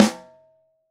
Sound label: SN1 Top, Snare; Ableton Foundation FreePacks; Snare Designer